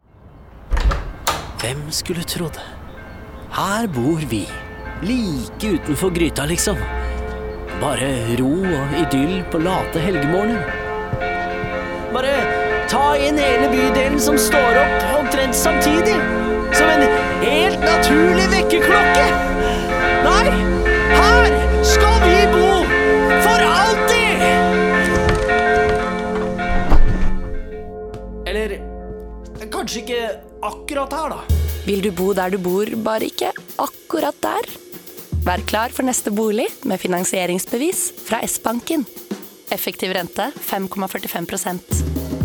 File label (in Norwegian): radiospot Kirkeklokker; Element; i vitrineskapet